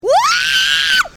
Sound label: screech